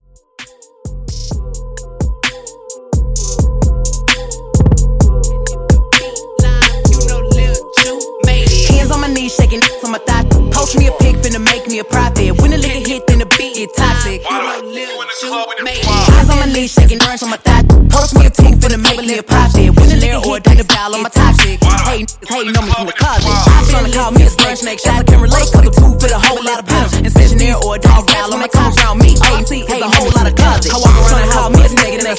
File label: Hip Hop Redrum; Remixfreakz